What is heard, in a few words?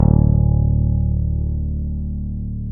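Fretless Octave, Electric Bass Fretless, Roland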